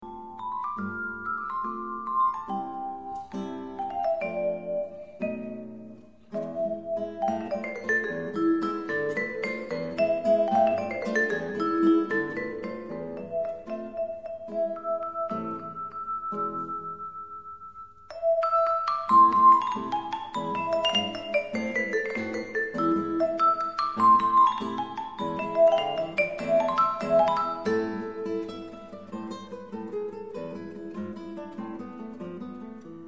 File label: estonian campanella